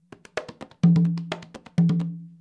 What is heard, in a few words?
rumba, congas